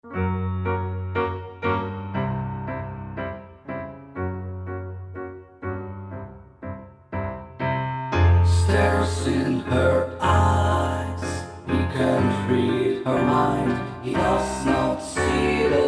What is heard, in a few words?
Vocal keyboard